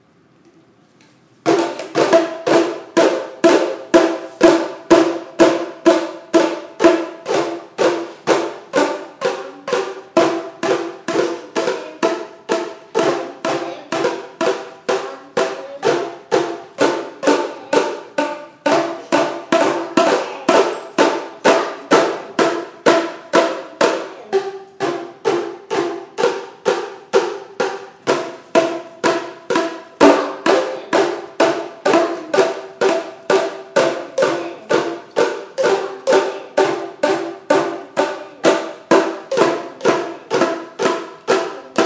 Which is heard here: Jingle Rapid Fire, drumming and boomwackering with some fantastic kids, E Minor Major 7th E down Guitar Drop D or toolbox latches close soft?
drumming and boomwackering with some fantastic kids